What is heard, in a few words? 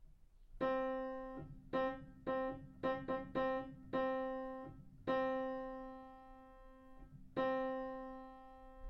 rhythm 8beat, violin; Rhythm Train Book 2